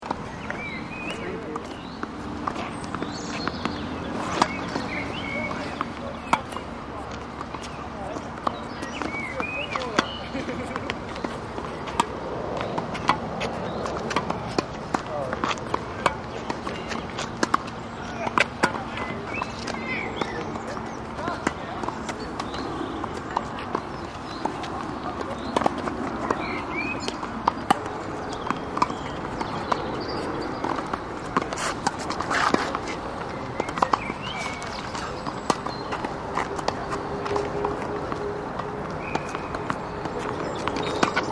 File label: Park Tennis, Tennis games being played in large park.; Sports